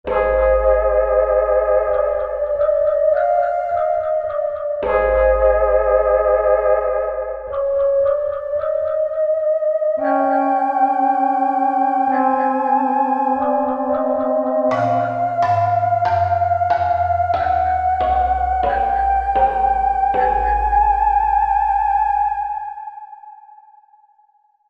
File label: Stranger, Korg MSC-1S; Korg MSC-1S Variety